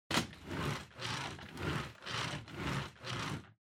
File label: Vacuum Cleaner Head Move